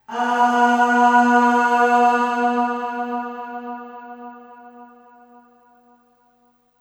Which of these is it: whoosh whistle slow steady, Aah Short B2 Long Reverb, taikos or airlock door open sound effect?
Aah Short B2 Long Reverb